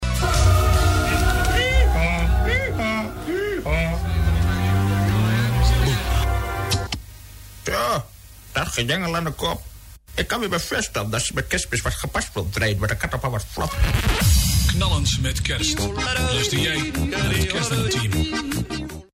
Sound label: Jingle; Audio Fragmenten